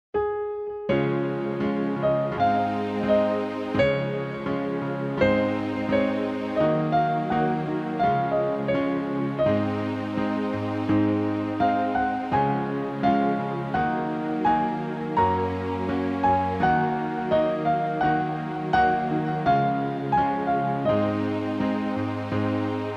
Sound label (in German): Klavier; Zu Bethlehem geboren